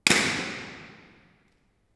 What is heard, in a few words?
Balloon Pop, Parmer Hall Live Balloon Pop Omni; room survey; Messiah College; Recital Hall Live Balloon Pop